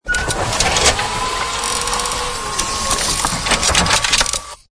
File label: Sci-Fi Mechanical; Ryan Van Eerde